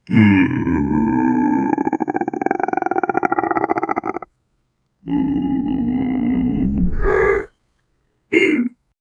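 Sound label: deep masculine villain laugh, villain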